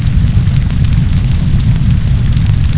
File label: boiler small; undervault